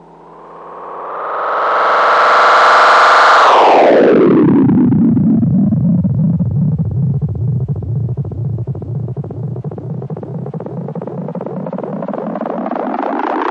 spin up spin down blurble; Atmospherics

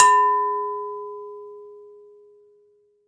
Glass Hit; ModeAudio

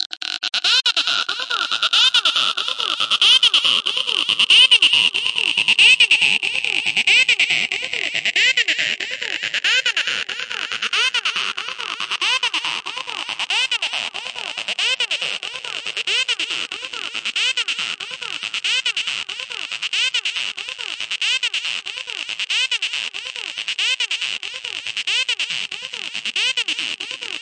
cosmic stuttering arp; Pads

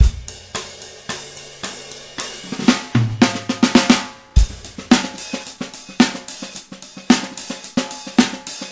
110 bpm, bright roller, drum loops and breaks

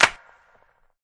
clap; Claps

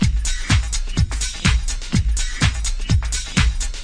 125 bpm, shimmering garage beat

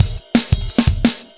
172 clangy purebeat; 161 to 180 bpm

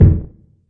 classic hip hop kick; STYLE KITS; Classic Hip Hop Mega Kit

